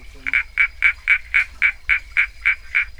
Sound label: Bokermannohyla sazimai